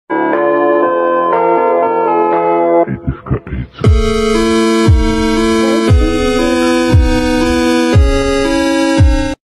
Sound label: nostalgia sad music